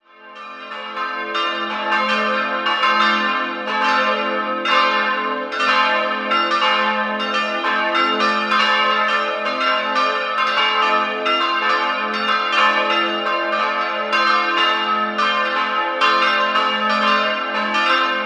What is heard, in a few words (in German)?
Mendorferbuch, Glocken